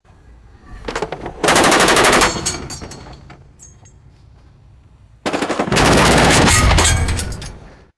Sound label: short bursts of bullets hitting hollow metal